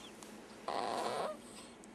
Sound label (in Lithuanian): atodusis žiovulys garsiai